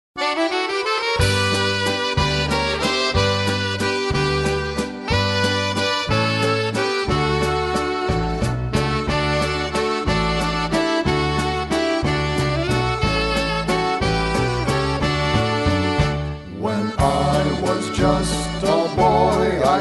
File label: Accordion (Waltz)